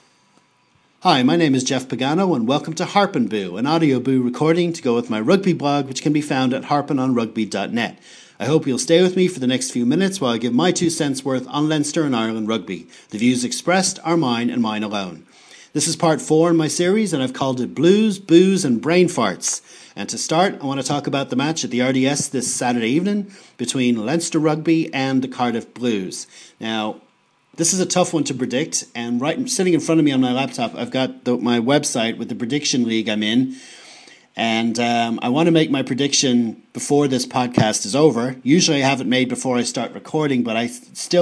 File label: blues harp